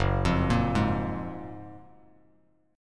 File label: Gb Major 9th, down, Bass Standard; GbM9; arpeggiated; JGuitar